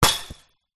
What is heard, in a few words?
sport soccer ball hit metal chain link fence